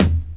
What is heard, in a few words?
KIT, Dance Mega Drums; Best Service